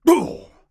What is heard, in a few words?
Warrior Grunt Jump; Hi-Tek Trooper; Realm Royale